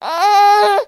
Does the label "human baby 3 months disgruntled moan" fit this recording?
Yes